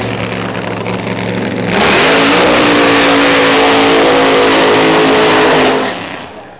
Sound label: burnout